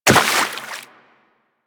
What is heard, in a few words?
Walk Water, Footsteps; Warframe; Operator